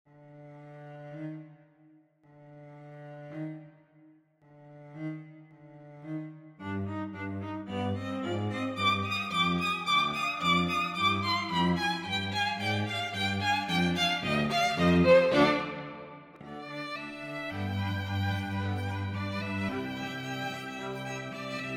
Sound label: String Quartet